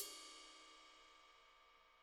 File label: RIDE LO, Interactive Drum Kits